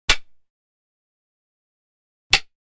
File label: Stick Hit; Hollywood Edge Martial Arts and Human Impacts